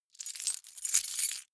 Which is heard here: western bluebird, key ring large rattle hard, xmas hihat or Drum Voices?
key ring large rattle hard